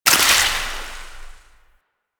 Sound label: Void Hit; Warframe